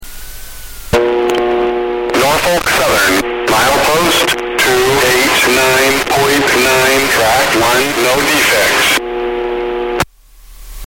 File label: TSA Christiansburg District Track